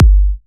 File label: Kick; WAProd Free Kick Samples 2018; WA Kick Pack 2